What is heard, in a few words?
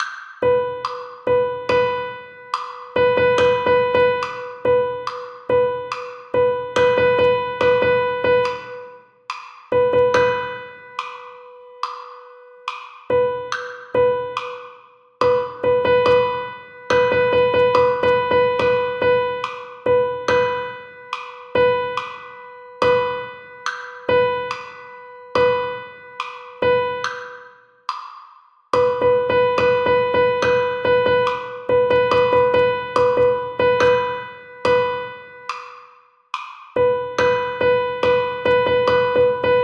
Rhythm Exercise